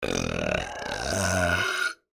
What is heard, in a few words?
belching